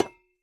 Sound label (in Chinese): 铜 破坏声